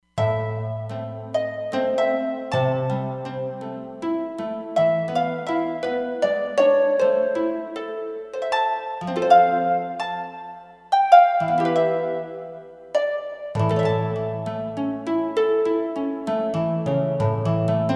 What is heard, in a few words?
Nylon Harp; Roland Fantom XR